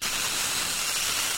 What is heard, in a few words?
car screech